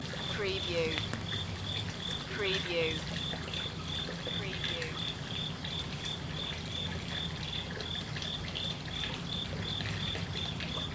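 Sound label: ambience koi pond night cricket Magome Japan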